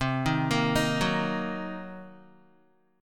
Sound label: C Minor 7th Flat 5th, C, Guitar; arpeggiated; Cm7b5; 8,6,8,8,6,x; 4E,4A,5D,5G,5C,6F